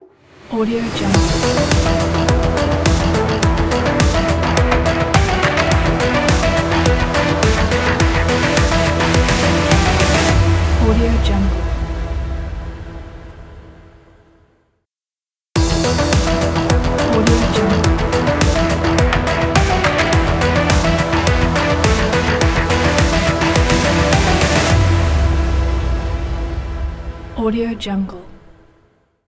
energetic electro opener logo

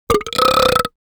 creature monster burp

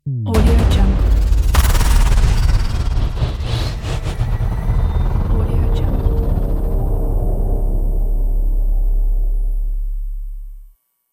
transforming logo

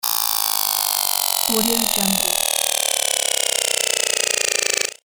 robot power down; floraphonic

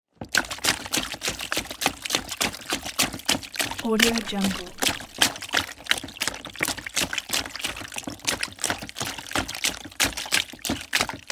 puddle running

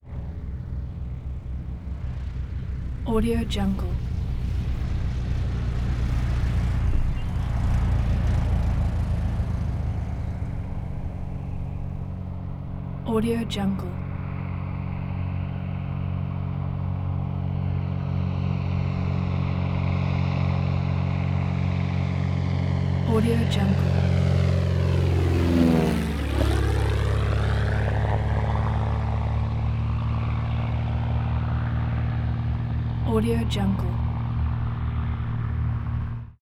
beechcraft debonair prop plane fly by; prosoundfx

